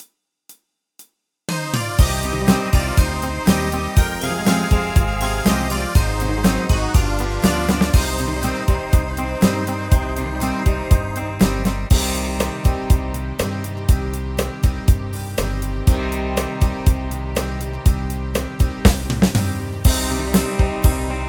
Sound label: Beat